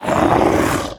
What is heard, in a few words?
Block UV Light; The Town